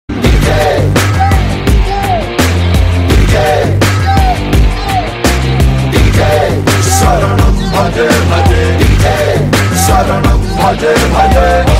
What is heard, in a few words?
DJ